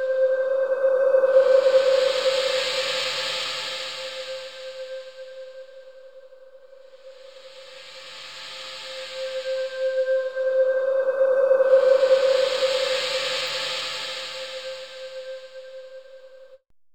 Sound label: metal loop, ambient; AMG - Vince Clarke Lucky Bastard